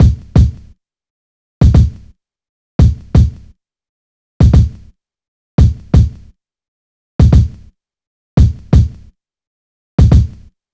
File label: Drum Loop - 86 BPM Kick, Boom Bap; Bristol; Cymatics - ANALOG EVOLUTION Beta